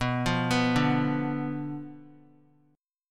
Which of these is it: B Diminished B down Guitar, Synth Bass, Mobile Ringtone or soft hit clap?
B Diminished B down Guitar